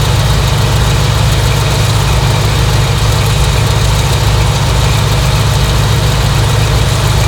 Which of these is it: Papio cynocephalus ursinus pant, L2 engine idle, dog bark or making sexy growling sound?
L2 engine idle